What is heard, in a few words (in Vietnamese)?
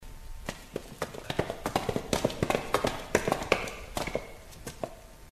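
Tiếng bước chân của nhiều người đi bộ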